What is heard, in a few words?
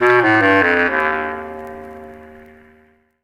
oboe